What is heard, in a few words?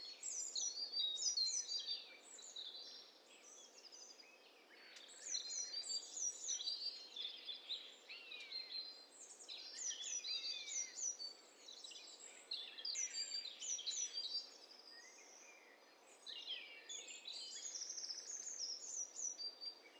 STEREO BIRD COCKTAIL PARTY